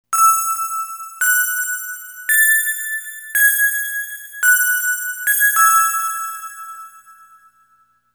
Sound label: bell; synth